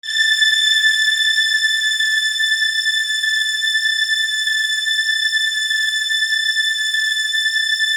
String

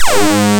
SAW SYNC; Analog Odyssey

